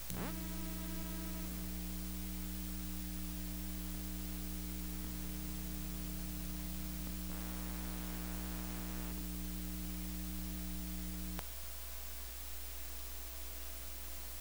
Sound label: Cassette Player-Dubber Display